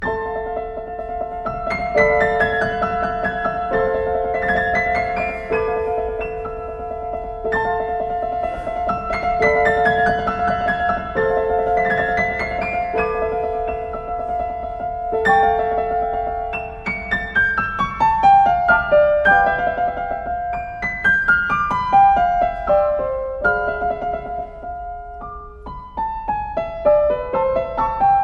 etudes pour piano; LISZT; d apres Paganini, etude 10

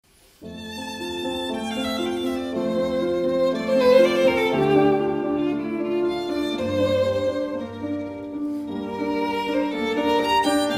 sonate pour violon et piano, allegro; BEETHOVEN; le printemps

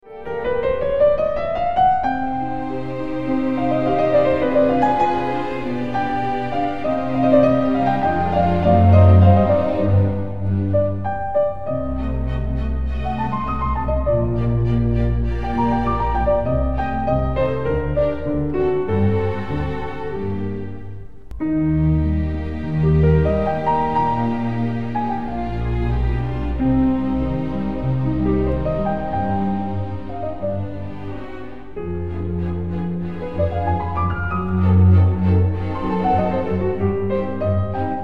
concerto pour piano, Larghetto; MOZART

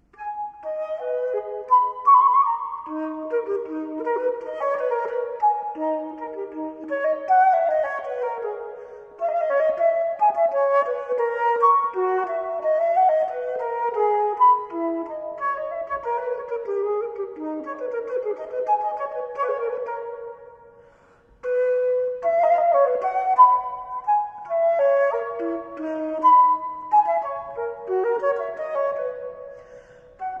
sonate pour flute en la m, allegro; BACH (Carl Philipp Emmanuel)

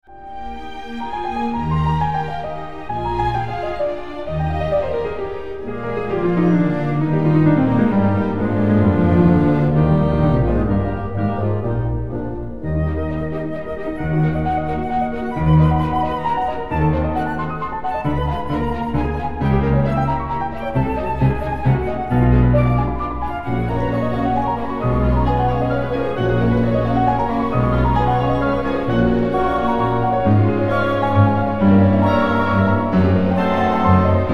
concerto pour piano, allegro; MOZART

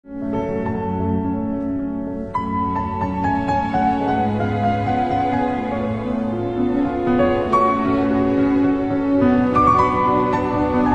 concerto pour piano, adagio; MOZART

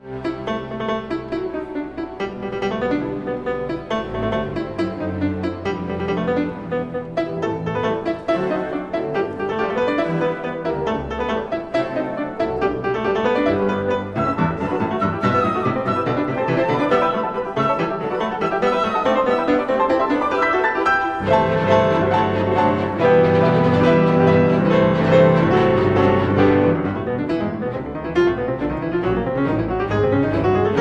concerto pour piano, allegretto; TCHAIKOVSKI

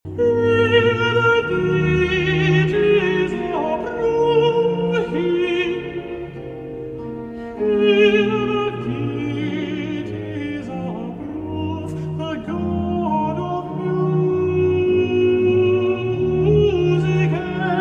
ode alto et Ritournelle; PURCELL, welcome to all the pleasures Z339

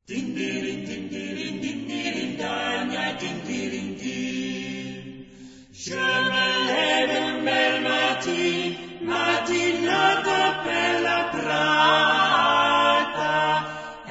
chant medieval; Dindirin Dindirin

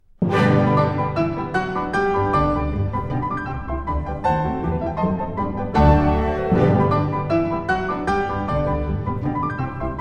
concerto pour piano, allegro con fuoco; TCHAIKOVSKI

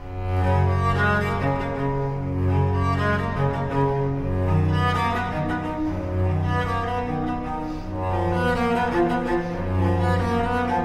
suite pour violoncelle; BACH; n1 Bwv 1007

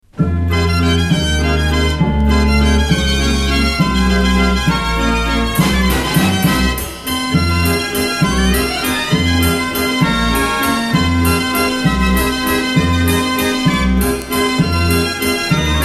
orgue de barbarie; traditionnel pays-bas

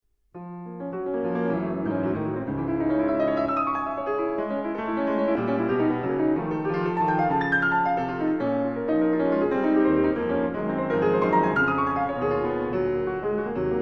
nocturne pour piano in B, allegro; FAURE (Gabriel)

